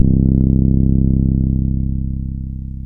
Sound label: ARP OMNIBASS; OLD SYNTHS; Rubber Chicken Software Sample Bank